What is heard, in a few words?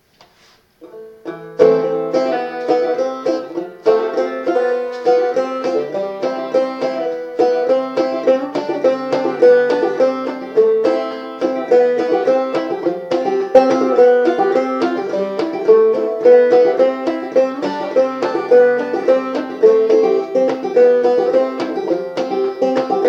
kids banjo, Banjo: Open G; Capo 0